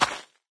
step dance; taunt; player; yeti standee; engineer; source engine; tf

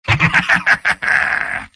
laugh evil; soldier; video games; source engine; tf; mvm

